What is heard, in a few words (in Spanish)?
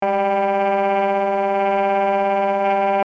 Tremolos